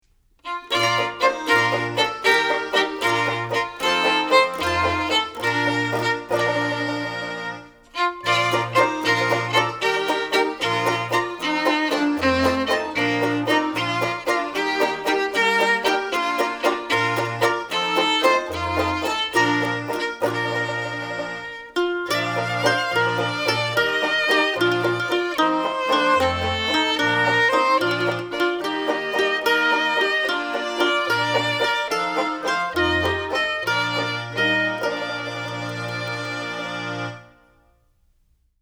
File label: the handcart song music only; childrens songbook